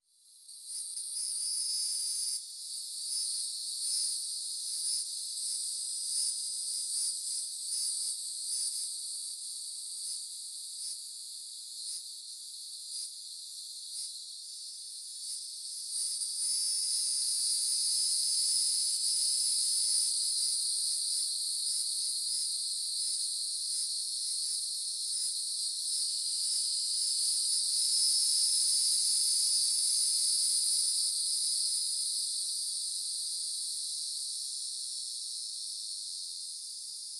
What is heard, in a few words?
upland hardwoods green mountain nature forest cicadas isolated; fvtt sonniss gdc audio